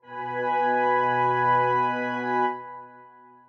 strings

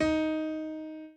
piano chord

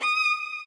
solo

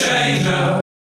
Key chant; Luxembourg Zwee

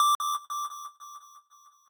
lead6

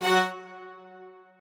strings

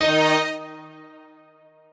piano

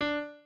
piano

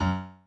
piano chord

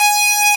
lead o6gp

